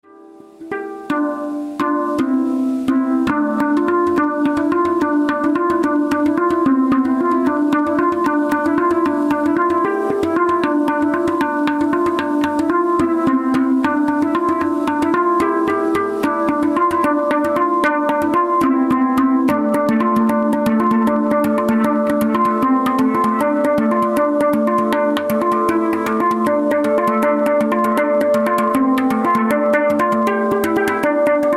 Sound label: Space Drum; RadioGEM